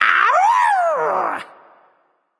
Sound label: battlecry; smite; voicepack; kukulkan